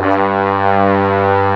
BRASS G1; Big Fish Audio; Synth City

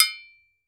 TOKE BEL, African Percussion; E-MU Producer Series Vol. 7 – Old World Instruments

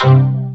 VOCODER DRUM

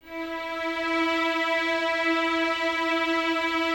E 4, String Ensembles Violins; Miroslav Vitous; 11 Violins

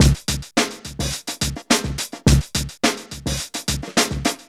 FUNKY; Zero-G; Funky Elements

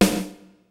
PHAT TRAX KIT, DRUMS; Kid Nepro; Elite

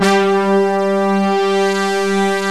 SYN BRS & CHOR

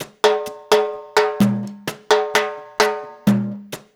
LOOP, Percussion, Danzon; InVision Interactive; Percussion Slam

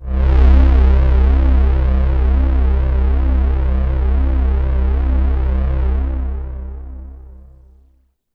Synths; JUNO106

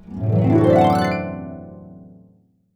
D DOM 7TH, HARP; AKAI S-Series CD-ROM Sound Library VOL-2; HARP GL

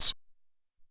Noiz Hat, Alesis SR-18

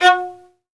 Synclavier Strings, SOLO VI; ILIO

